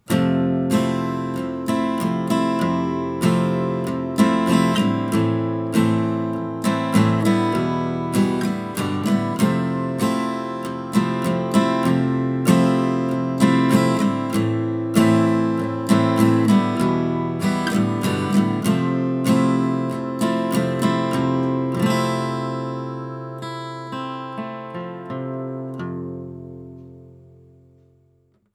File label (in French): guitare